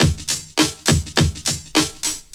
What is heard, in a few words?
Old School Break, Drums